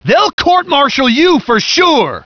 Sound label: hurt soldier